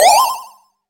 mimic reveal, creatures

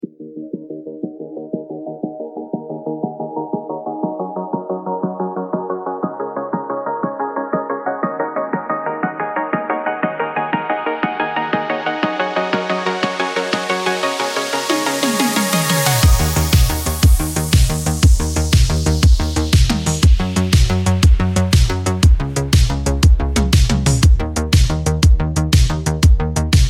synthwave drive, music; freepixel; Retro Genres

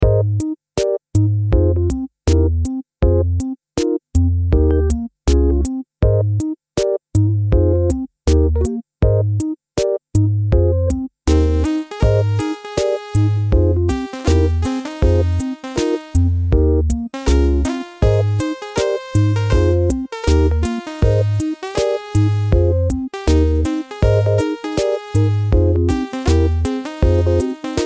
jazz lounge, music; freepixel; Retro Genres

